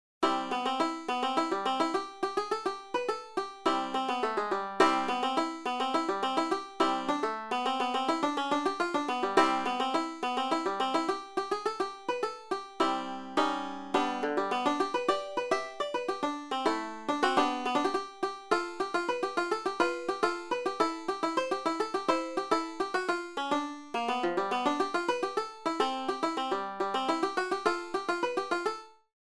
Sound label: Palladium Rag